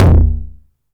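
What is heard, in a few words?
909 mid tom tape saturation; Goldbaby Tape808 & Tape909; The Tape 909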